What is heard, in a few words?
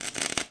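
ambience interior arc; Tony Hawk's Project 8; XBOX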